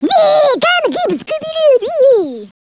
success; GUBBLE

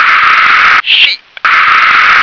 sheep

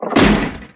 SMASH

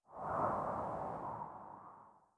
WND GUST

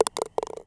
CUP

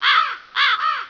four caws

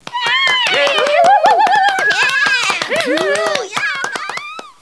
crowd

